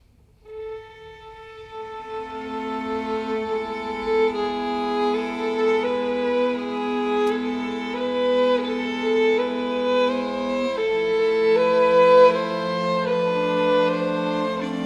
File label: Quart Wave, A-B AKG; Stereo